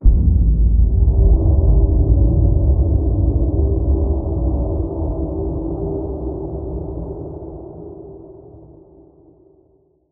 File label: amb startup boomlight, ambient